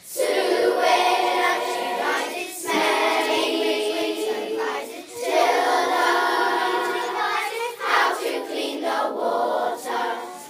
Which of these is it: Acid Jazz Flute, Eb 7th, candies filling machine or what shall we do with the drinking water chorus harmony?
what shall we do with the drinking water chorus harmony